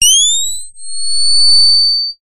science fiction device power charge high pitched

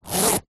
purse pouch leather zip; foley

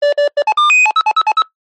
cb radio call ring tone random

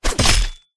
impact sword swipe into large wood hit

